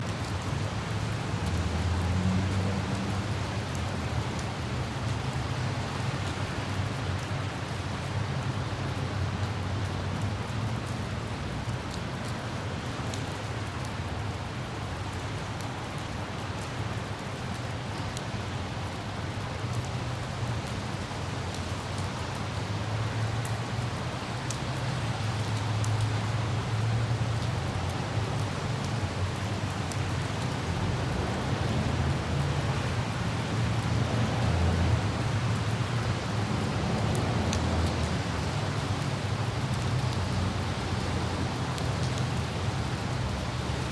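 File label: pleasant rain sounds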